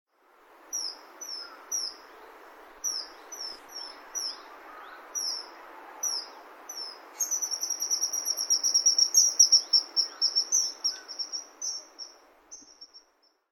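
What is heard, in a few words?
Common Kingfisher; kawasemi; saitama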